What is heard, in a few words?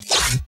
ZZWAP, STRANGEFX; Total Control - Action Cutz